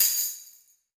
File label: Karaoke Tambourine